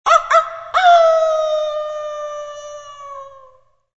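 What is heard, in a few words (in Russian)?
вой далекий собачий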